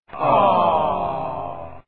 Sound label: aww; hl2dm